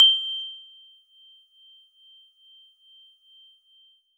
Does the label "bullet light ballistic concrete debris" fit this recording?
No